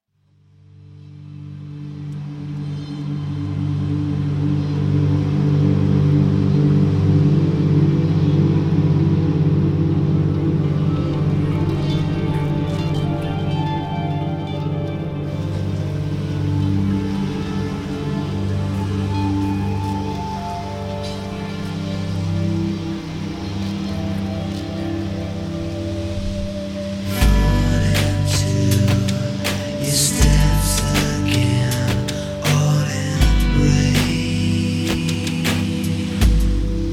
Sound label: Soft Rock